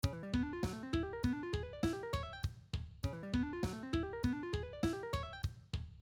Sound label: Chromatic Diminished Ascending String Skipping Exercises, guitar